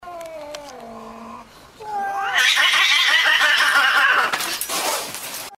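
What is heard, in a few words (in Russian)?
draka koshek, cat fight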